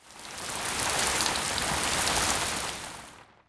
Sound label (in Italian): Acqua, natura